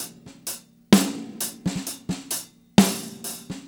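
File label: 65 bpm no kick, beat loops; Zero-G; Monster Beats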